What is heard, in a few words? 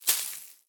Tumbleweed Impact